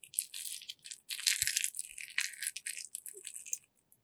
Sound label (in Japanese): 引き抜き